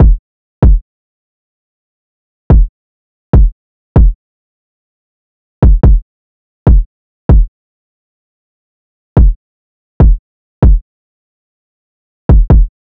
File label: Drum Loop - 144 BPM Kick, drill; Loop Stems; Cymatics - GHOST (Beta Pack); Arrived Drum Loop